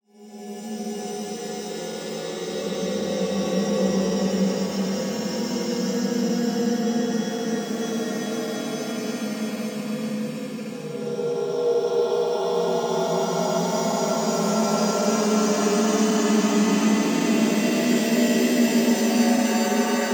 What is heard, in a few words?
Atmospheric; 99Sounds; The Weird Side Samples